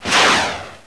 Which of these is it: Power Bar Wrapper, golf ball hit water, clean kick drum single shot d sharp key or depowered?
depowered